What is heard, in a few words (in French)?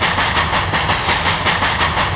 tchou; MOBICLIC; sciences